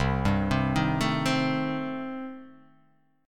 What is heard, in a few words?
C Minor 6th, chord